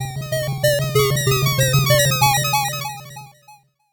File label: 8bit jingle normal